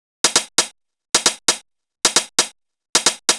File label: Dance Drumloop